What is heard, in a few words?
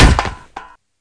metal